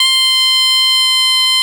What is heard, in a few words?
BP MID C3; USB Soundscan vol.42 - Celtic Flavours; AKAI